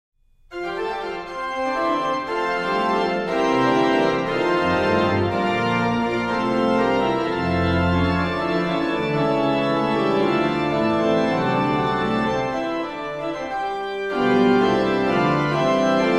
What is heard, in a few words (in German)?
Lobt Gott ihr Christen allzugleich, Bach Organ Works Chorale Preludes; BWV 732; Individual Chorale Preludes